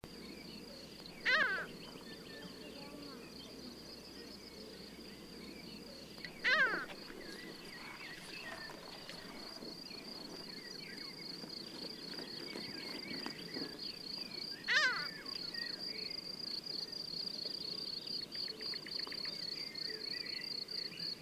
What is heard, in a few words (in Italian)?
Gabbiano corallino Ichthyaetus melanocephalus